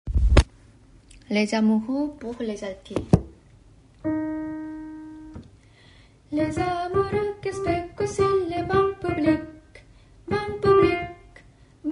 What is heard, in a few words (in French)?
les amoureux alto